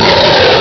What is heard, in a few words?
roar; jurassic park